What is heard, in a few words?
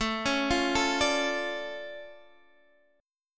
D Minor Major 7th, A, guitar